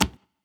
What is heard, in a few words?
UI switch; The Avali's Audio Overhaul